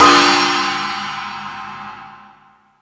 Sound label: Crash 2, drum machine